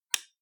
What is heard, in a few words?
switch, UI Audio; Kenney's Sound Pack